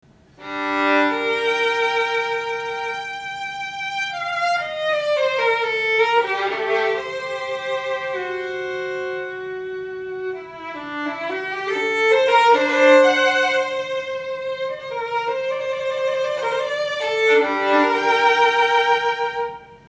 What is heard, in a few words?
violins